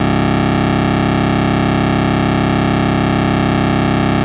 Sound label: TONE